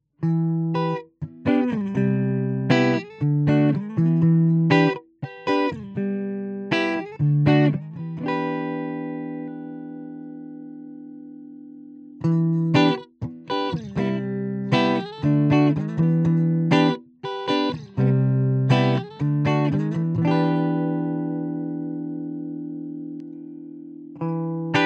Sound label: cln